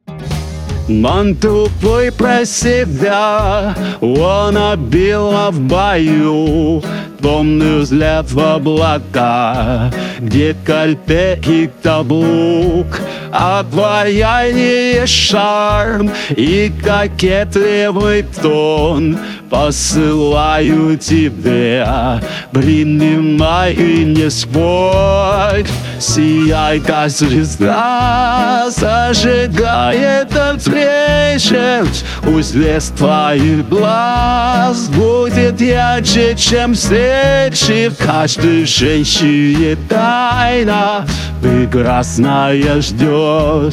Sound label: Female; RVC